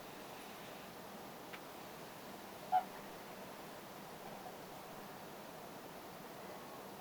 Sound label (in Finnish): laulujoutsenen aani